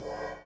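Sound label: ride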